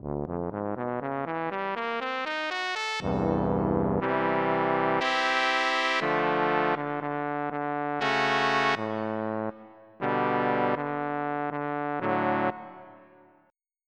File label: Tuba; FFvii; sf2